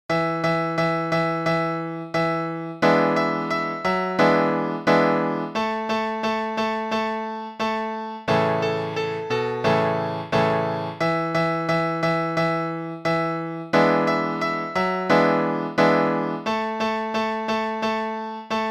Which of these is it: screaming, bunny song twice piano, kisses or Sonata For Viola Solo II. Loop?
bunny song twice piano